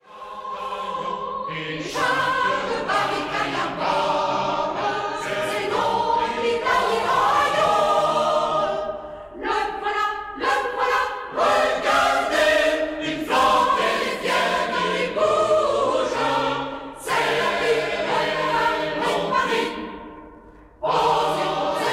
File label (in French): le drapeau rouge, chorale